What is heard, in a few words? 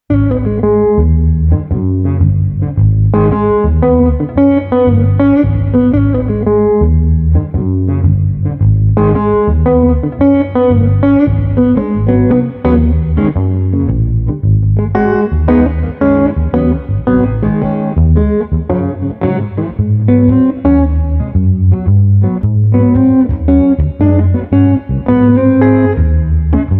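Street Guitar Bass Funky Mellow, 90 bpm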